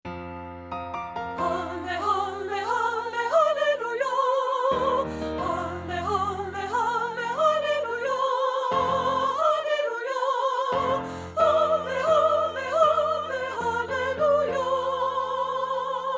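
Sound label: soprano 1; treble; partbypart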